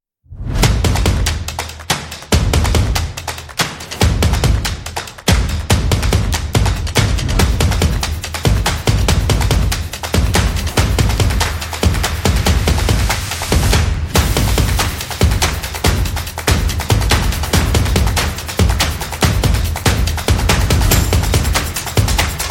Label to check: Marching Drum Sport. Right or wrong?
Right